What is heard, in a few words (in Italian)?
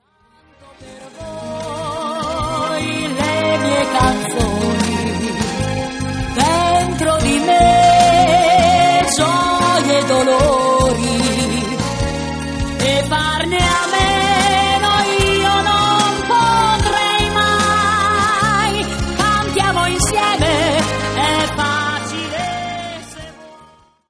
canto